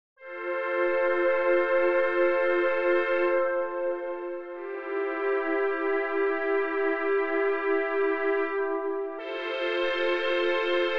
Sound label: ARP 2600 V